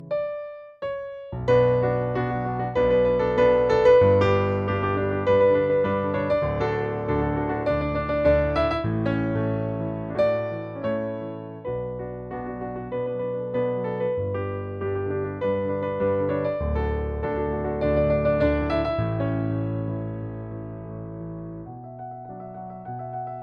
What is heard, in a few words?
piano cover